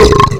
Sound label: burp; mother2